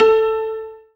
ZIMBAL A 1, ZIMBALON

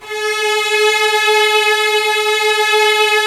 STR LUSH S, MIDI Section

